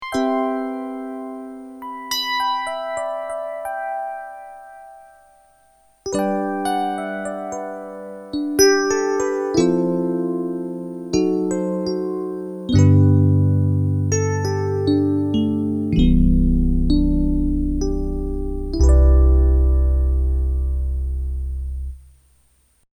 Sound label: E Piano